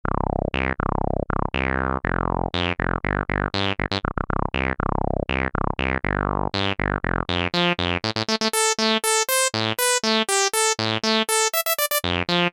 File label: ensoniq fizmo filter bass rez